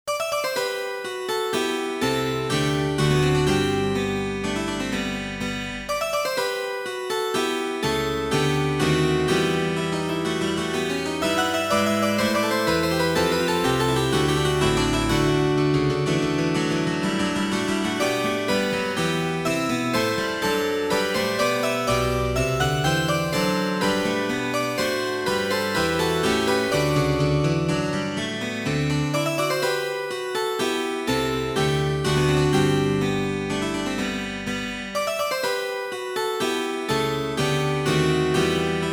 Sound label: Courante